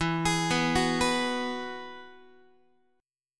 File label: E Major Add 9th E, Guitar; arpeggiated; 4A,4E,5A,5D,5G#,6C#